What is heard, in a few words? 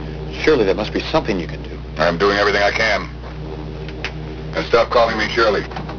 AIR